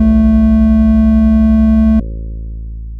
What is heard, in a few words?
tone